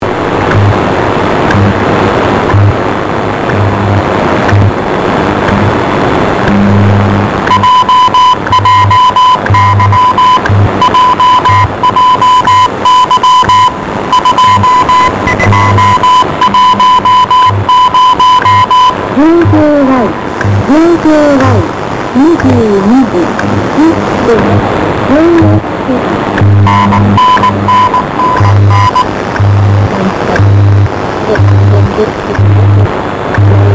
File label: jjy 5MHz